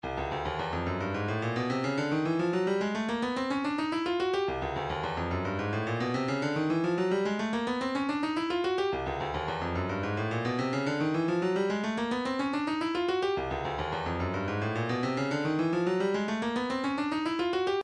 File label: indiatek tabla, midi